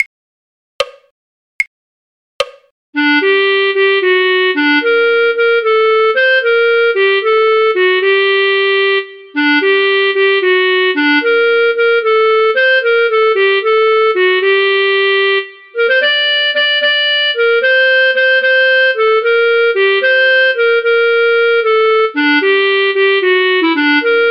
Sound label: clarinet; the miller of dee; folk